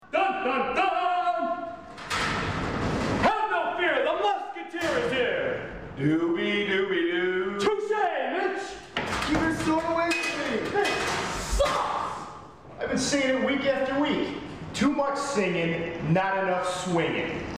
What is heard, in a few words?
too much singin